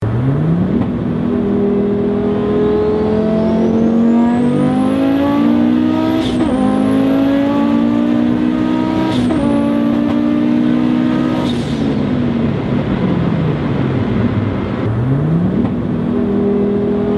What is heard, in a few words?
audi s2 turbo